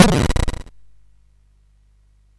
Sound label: SFX; Narco Police